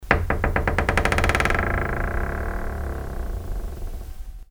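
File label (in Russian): скрип открывающейся от ветра межкомнатной двери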